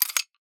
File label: weapon; Monte Carlo (reload 1)